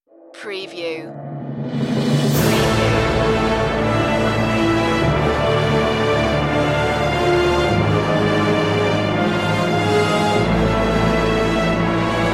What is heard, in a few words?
grand ending only no choir